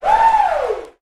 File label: "woo"